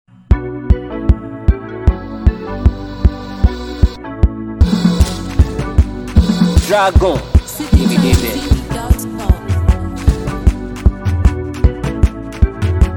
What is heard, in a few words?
Beat